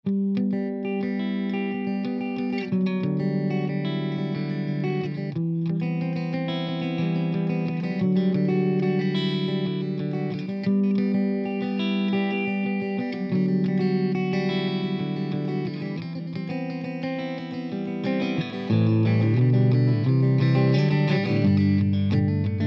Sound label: clean countryish